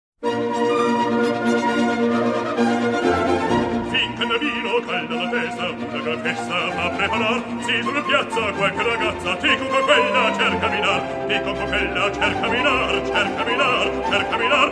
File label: champagne aria